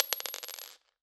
Drop On Floor, Medium Wine Glass

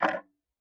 Tick, Clocks Kitchen Clock

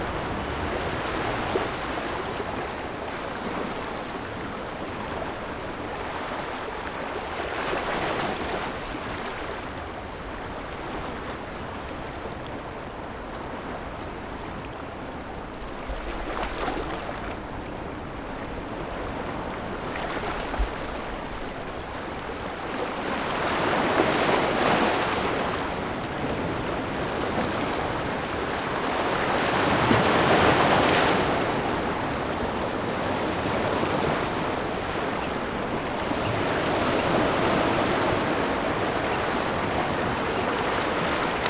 Seashore, Waves

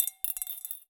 Drop In Glass, Medium Wine Glass

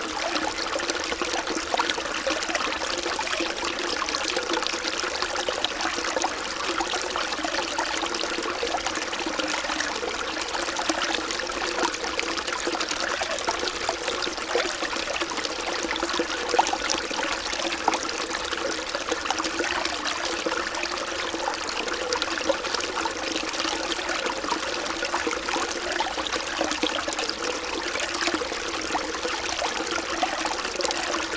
River, Static Microphone

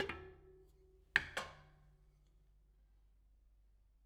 Spoke Bounce, Bicycle

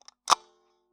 Taxi Meter

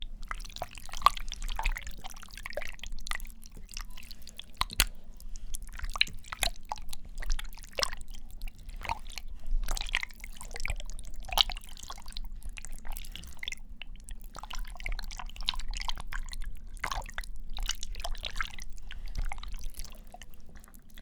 Livers Glass

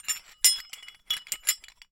Hole Saw Rattle, Hole Saw Bits

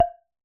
Wooden Bar Strike

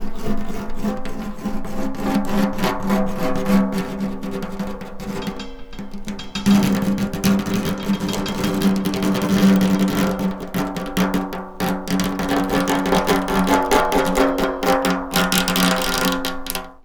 Snare Drum; Percussion Samples

